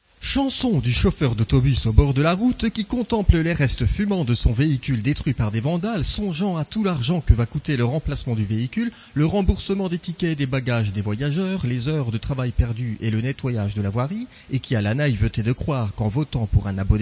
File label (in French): Chanson du chauffeur d autobus Folk